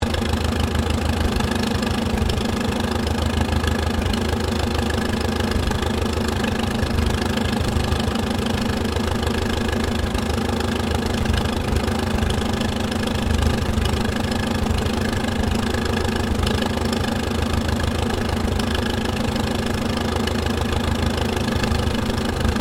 Tractor McCormick Deering W12 1937 idle loop, Old Farm Tractor; Tractors; Vehicles